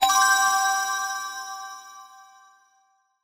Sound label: bling